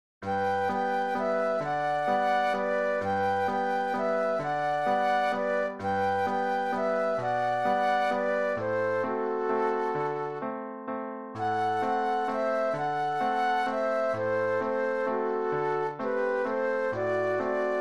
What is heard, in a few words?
Flute Duet and Piano